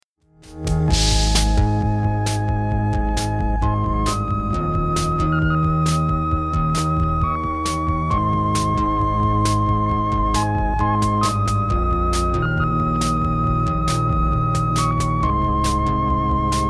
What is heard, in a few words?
flute